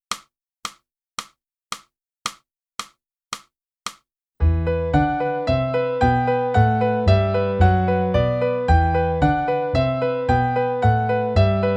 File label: flute; boosey